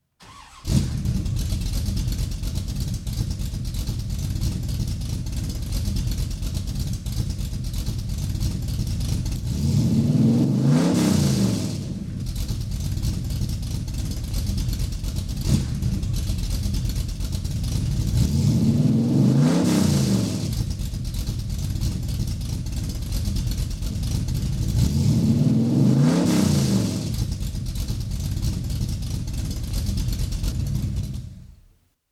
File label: Ringtone; Ultimate Classic Cars